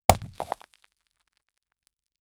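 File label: impact hard resistant rock, boulder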